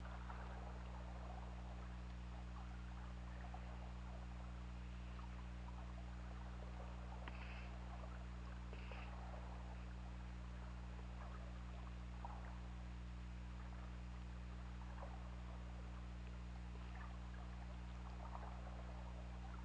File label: Orca; OrcaLab